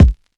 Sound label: dry kickdrum sound a sharp key; kick drum samples